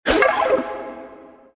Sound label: Rolling Pin; Cookie Jam